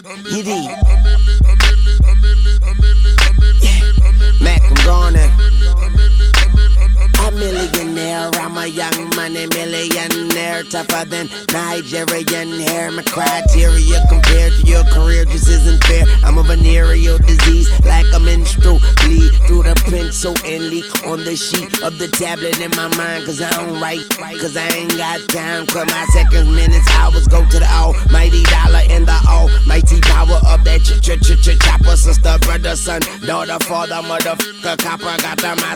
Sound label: hip hop audition cut